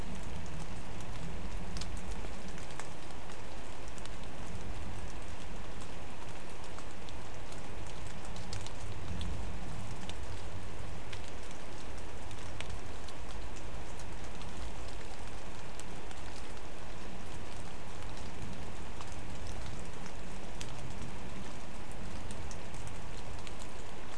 mainly rain

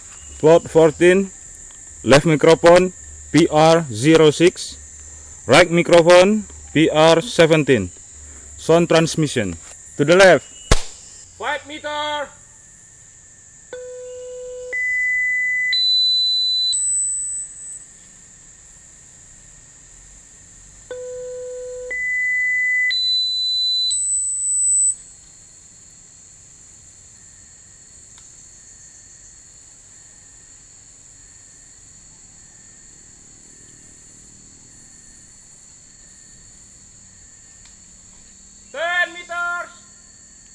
birds

